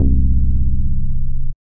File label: Bass, synth bass; Keys of Life Bass; Ableton; Factory Packs; Singularities